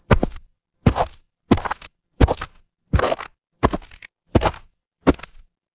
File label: footsteps gravel